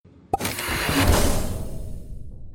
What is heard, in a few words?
Ship; Astroneer